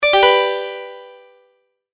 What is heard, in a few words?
multimedia tone, Goodbye